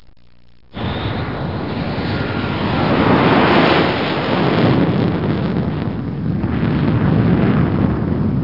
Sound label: jet